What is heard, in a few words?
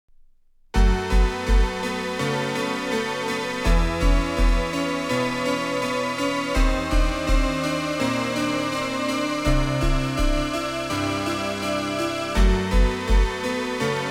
Synth City Remix